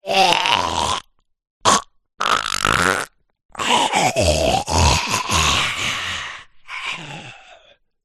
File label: horror zombie groan child